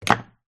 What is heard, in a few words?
herb spice jar small down on table